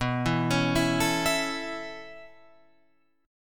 B 7th Suspended 2nd Suspended 4th down Guitar Open F, chord; B7sus2sus4; arpeggiated; JGuitar